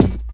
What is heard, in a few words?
BD -L, bass drum, Drum & Bass; Ueberschall; Drum & Bass Resonance